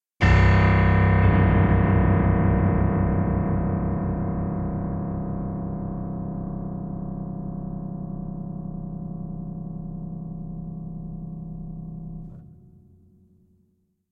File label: piano notes; SyrinScape; Madness in the library